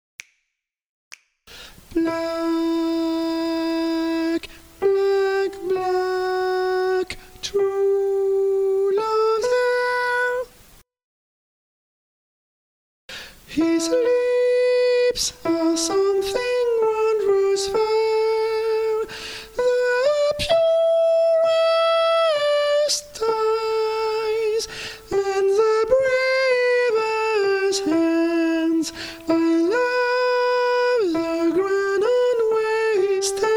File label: soprano; black is the colour